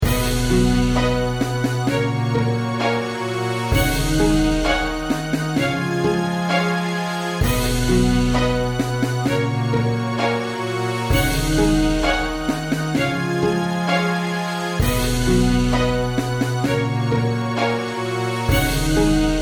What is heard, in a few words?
instrumental